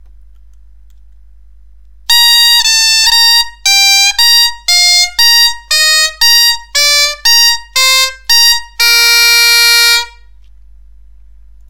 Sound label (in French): gamme descente progrйssive, bombarde; Revenir à chaque fois sur le Si aigu; Si/a La Si/a Sol Si/a Fa Si/a Mi Si/a Ré Si/a Do Si/a Si/g; Descente progréssive